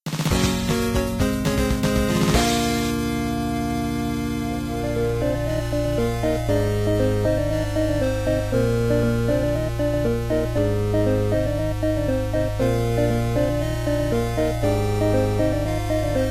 Victory (w- Intro, No Looping); Knights of Pen & Paper

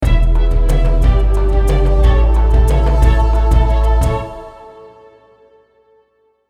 Victory Sting; Grindstone

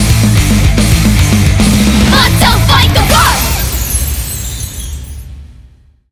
BGM Reach

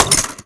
UI Menu Continue HealingElixir Fail

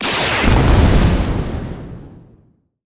Enemy Majin Active Shot; Disney Star Smash; Android, iOS, Mobile

